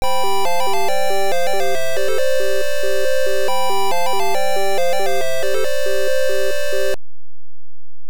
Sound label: Boss Clear; Exed Exes; NES